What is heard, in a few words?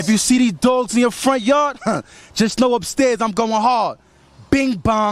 bing bong; upstairs